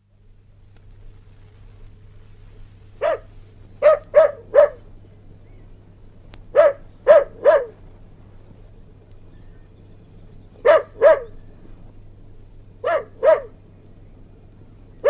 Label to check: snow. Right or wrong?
Wrong